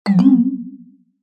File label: Fanfare; Legend of Zelda Skyward Sword